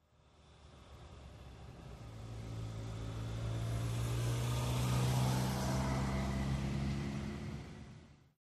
Car, By Medium Speed, Medium Cu with Acceleration On Away.